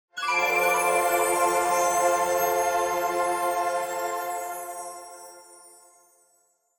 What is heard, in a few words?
Angel Timbre Long